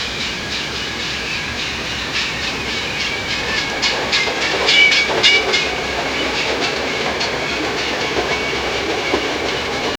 realistic ambient sound, rails

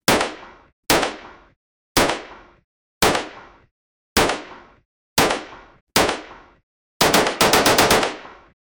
shot from gun in fps

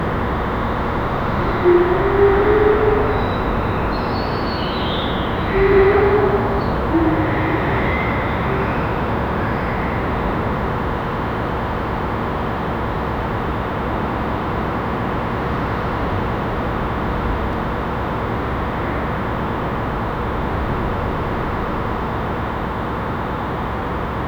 city neiborhood ambi; 1995

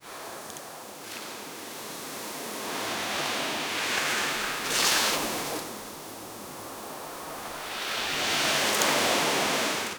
calm beach and sand sound

